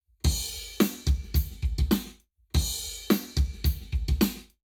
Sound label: Lab Wet Drums 0to360deg; eMagLS